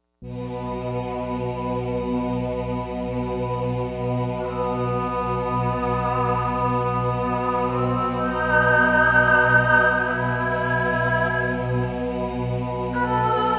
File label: Erhu Fantasy; Digital Synthesizer